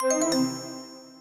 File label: sms; Lenovo; P700i